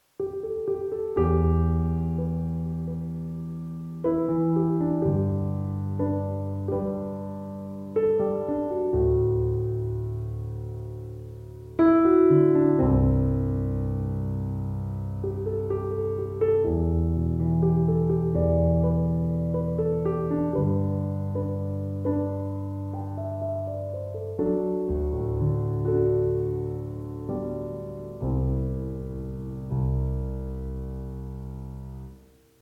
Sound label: Fuzzy Basement Piano